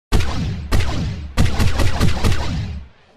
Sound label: gun; VAPEN; KRINGLJUD